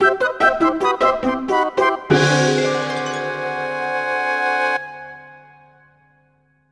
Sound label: You Lose